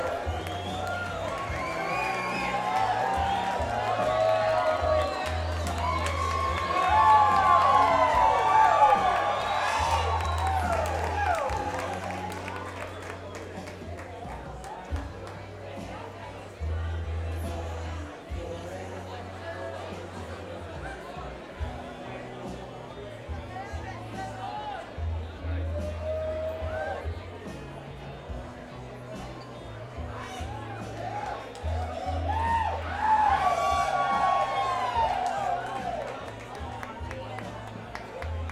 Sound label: Crowd